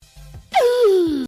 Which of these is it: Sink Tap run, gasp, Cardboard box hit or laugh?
gasp